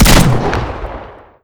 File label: auto shotgun fire; eva8